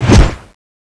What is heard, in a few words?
axe slash, weapons